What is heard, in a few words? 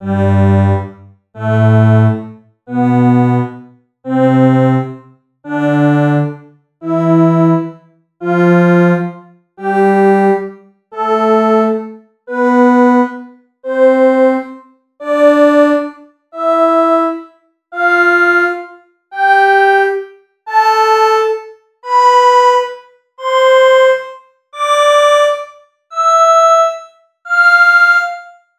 stringensemble